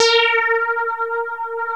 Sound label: FAIRLIGHT; Greytsounds